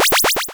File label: pow; pygame shmup